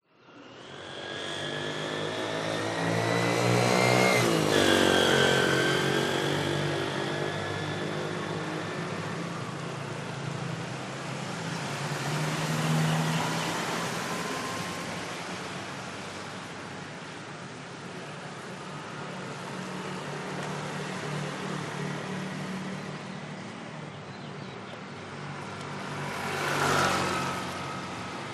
traffic motorcycles cars wet road, thailand chiangmai